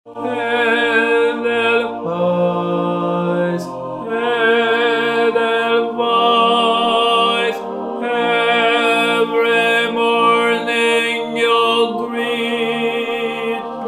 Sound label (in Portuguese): tenor